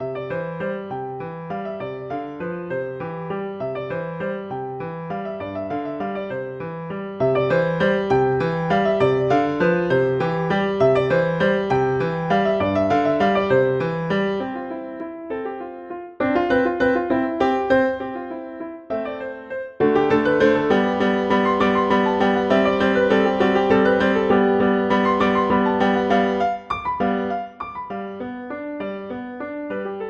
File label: Sonatina, Vivace; Clementi; Sonatinas